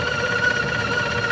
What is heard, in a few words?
Whiney Motor, golfcart